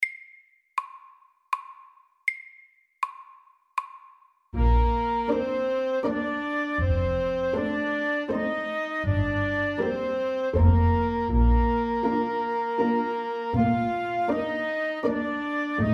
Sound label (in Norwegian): Legato vals SAKTE